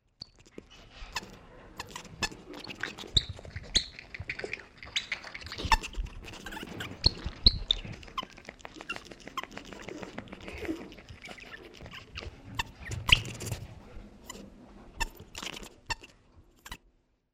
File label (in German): Suedamerikanischer Nasenbaer; baeren unterwegs bruno und seine freunde